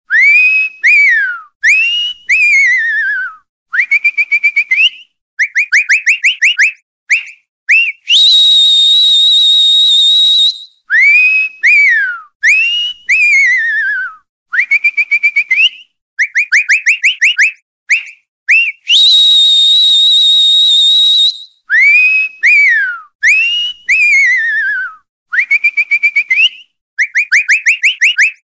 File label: whistle